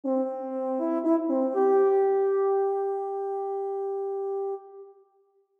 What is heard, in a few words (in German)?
mit wagner tuba; hoermarke